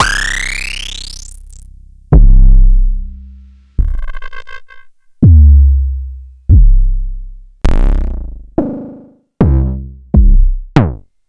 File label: Kick c